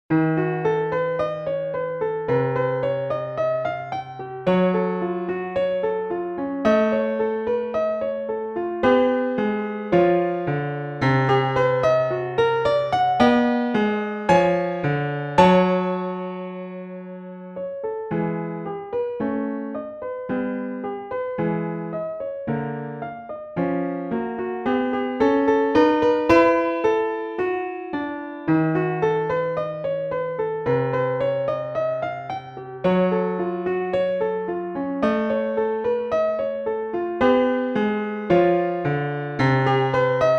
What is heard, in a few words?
Piano Exercise in 15 Notes per Octave; microtonal